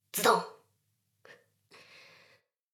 しこしこ, ASMR; ダウナー風俗

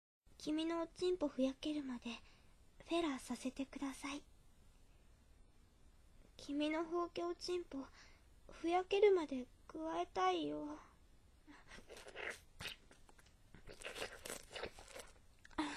包茎ちんこをフェラさせてザーメンも飲んでくれる女の子; 100円で包茎ちんこをフェラさせてザーメンも飲んでくれる女の子

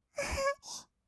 あはっ, kawaii voice; 1200 kawaii voice!!; 色々なワンショット

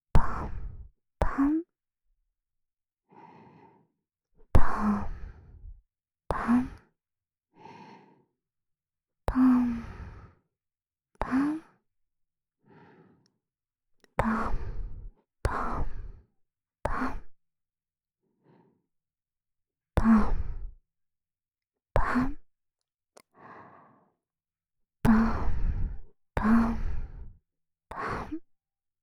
ぽん、ぽん; ASMR

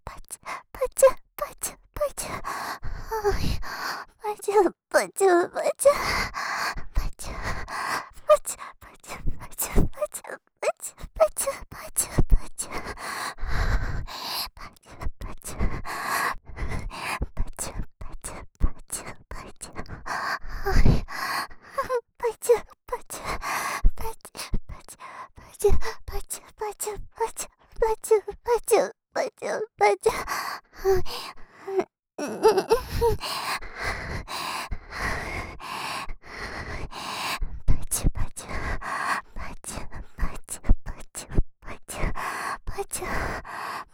ぱちゅぱちゅ, ASMR, 左耳

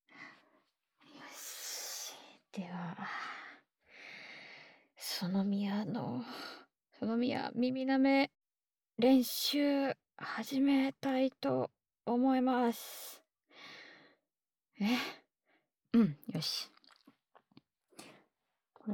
耳舐め練習

